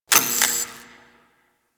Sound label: Derelict Light Flicker; Ambience; Props; Warframe; Orokin Derelict